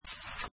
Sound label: card swipe; inlogic-blackjackmaster